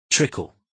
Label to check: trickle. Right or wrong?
Right